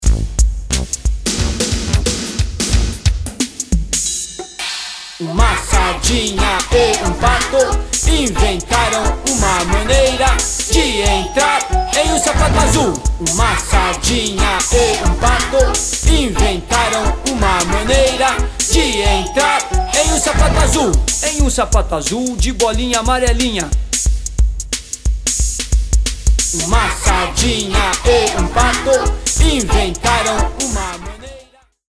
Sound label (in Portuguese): Rap Soul